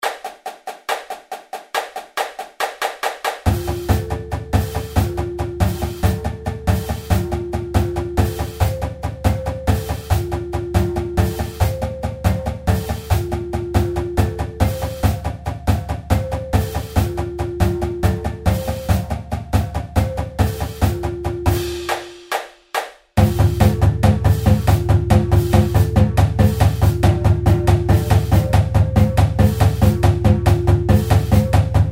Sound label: Accent Tap 140 bpm